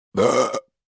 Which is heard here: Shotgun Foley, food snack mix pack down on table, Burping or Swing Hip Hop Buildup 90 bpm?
Burping